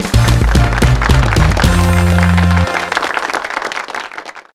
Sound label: Medium Jingle; xtreme sports pepsi max extreme sports pc gamerip